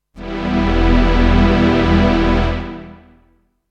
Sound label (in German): Pad Release Time 1500 ms minus 100 prozent